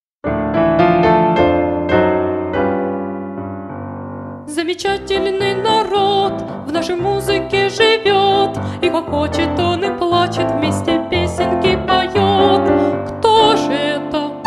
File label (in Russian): pesenka pro intervaly